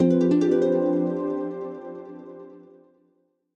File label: Jingle Study Levelup; Bullet Girls Phantasia; PS Vita